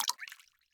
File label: water drop